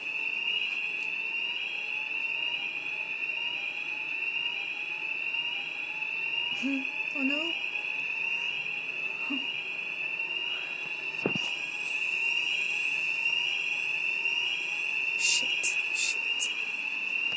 the fire alarm scares me

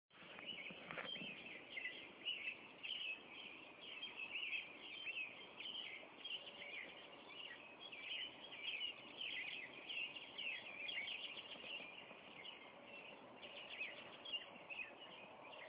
sounds of a spring morning

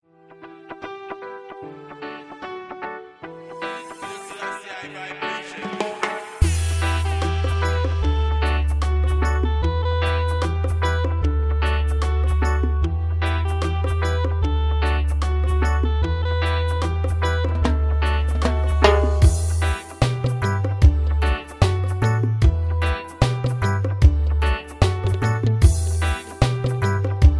the beat of reggae dub

